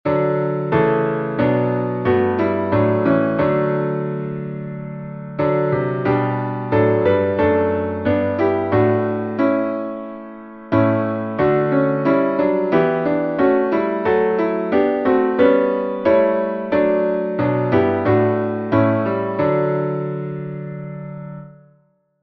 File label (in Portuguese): salmo instrumental